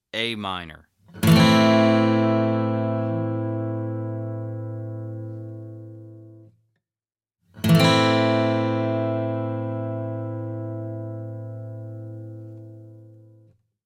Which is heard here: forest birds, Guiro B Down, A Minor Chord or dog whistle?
A Minor Chord